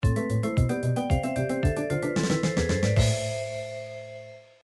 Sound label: block clear; Nes Castlevania 3